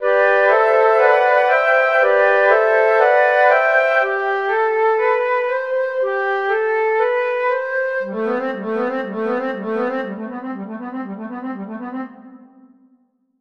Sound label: Flute mimic Rev